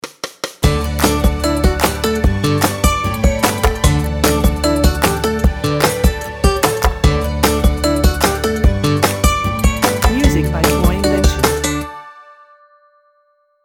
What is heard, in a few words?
The Simple Life stinger